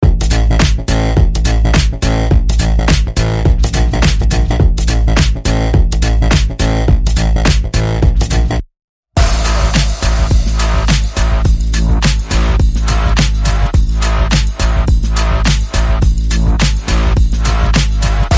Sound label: Percussion Bass and Effects